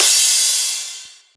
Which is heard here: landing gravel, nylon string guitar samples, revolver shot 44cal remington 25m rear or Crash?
Crash